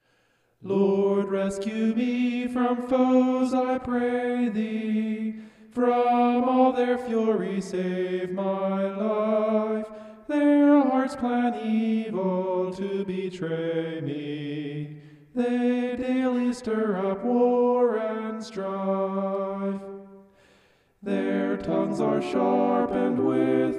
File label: vocal homoph; psalm 140